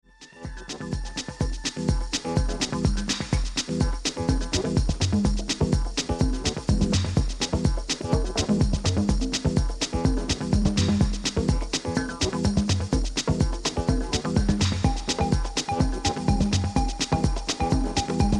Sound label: Dirty Drums